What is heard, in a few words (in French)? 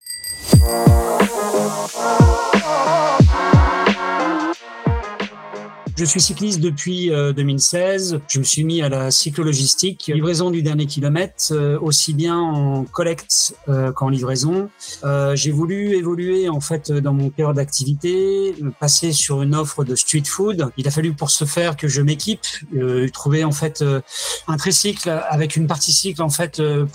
jingle